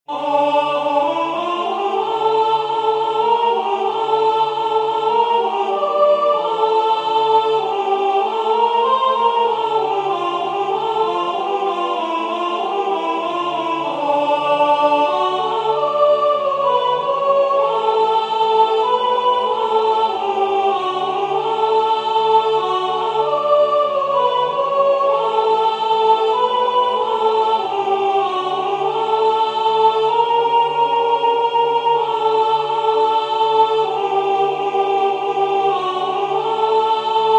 A La Nanita Nana sopr; Soprano; Christmas 2024; Waiheke Island Choral Society

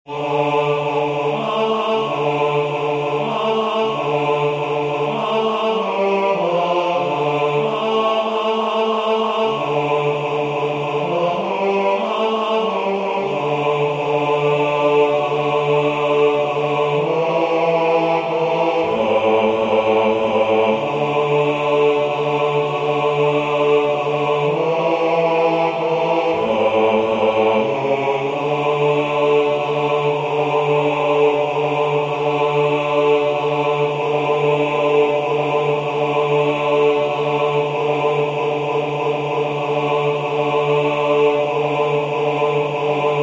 A La Nanita Nana bass; Christmas; Waiheke Island Choral Society

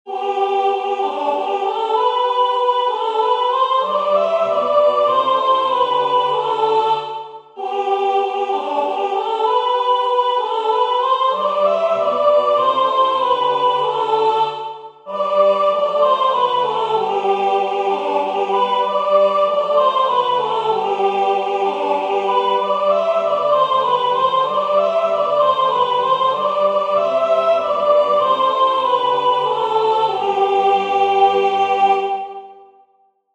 O Come Rejoicing sopr; Christmas 2024

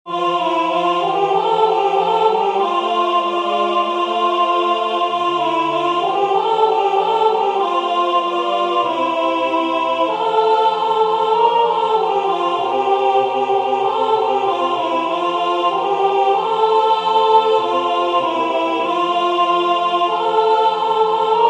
Shepherds Came to Bethlehem alto; Christmas; Waiheke Island Choral Society

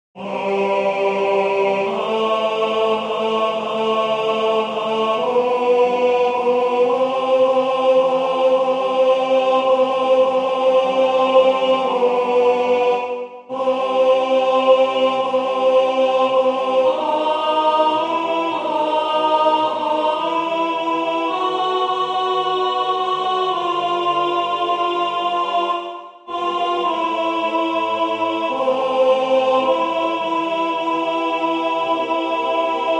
alto; Christmas 2024

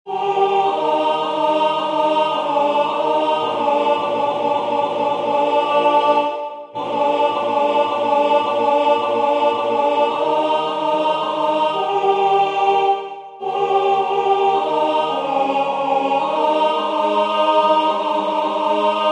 alto; Christmas 2024

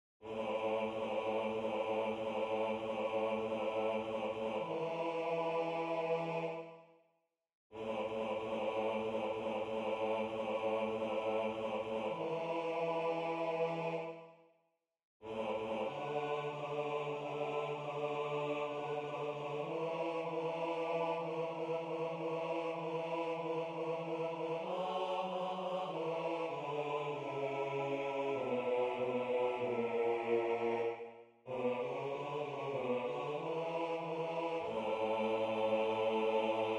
The Hills Grow Lighter bass; Potpourri 2025

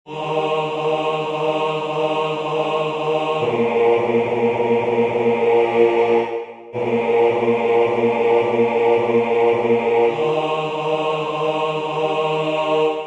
bass; Christmas 2024

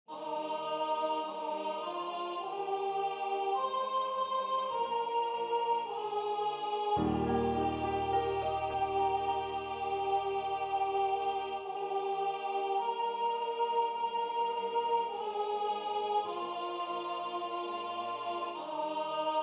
Elijah sopr; Christmas 2026

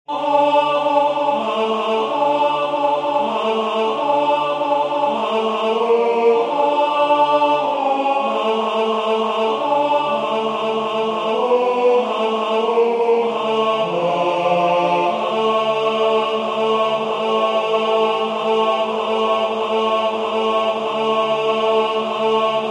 A La Nanita Nana tenor; Christmas; Waiheke Island Choral Society

